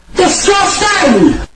disgustang

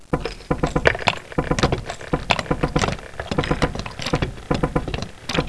big move, plats

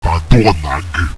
pain; boomario

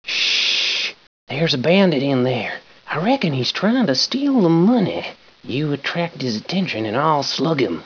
ssshhh; hoss

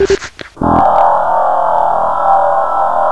robot echo

